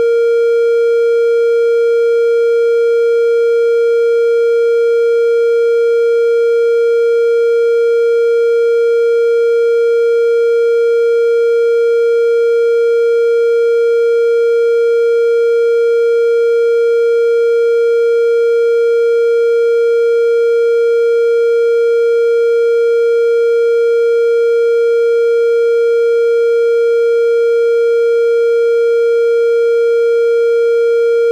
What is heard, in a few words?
triangle